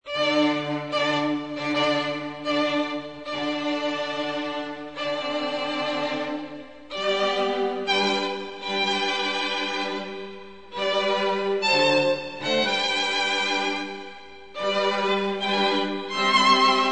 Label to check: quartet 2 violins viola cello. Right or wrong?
Right